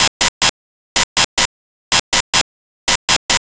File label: Telemetry Loop, Sci Fi; 1001 Sound Effects